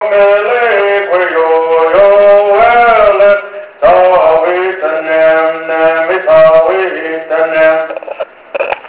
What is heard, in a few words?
Bird